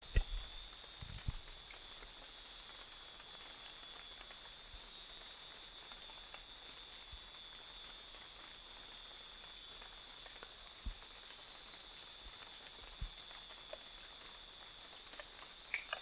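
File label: Hyalinobatrachium sp. 1, frog; guyane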